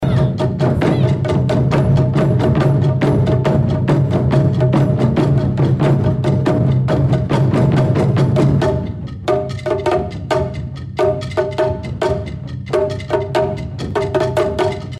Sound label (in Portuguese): SOBE SOM TAMBORES